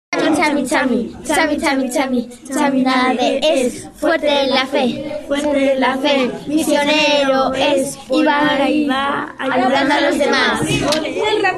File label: rap